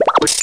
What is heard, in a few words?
pop; Bible Fun Pack; Education Publishing Concepts; NOAH